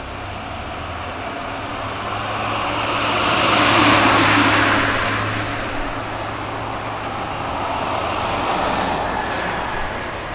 truck passing, street traffic; best service Blue Box

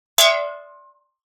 Metal Pan Ping Hit

